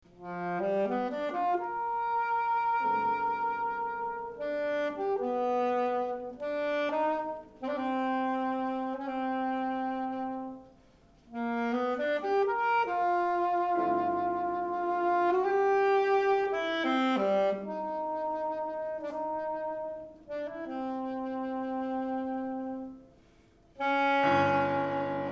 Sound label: Sax Fantasy